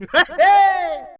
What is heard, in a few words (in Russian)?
smeh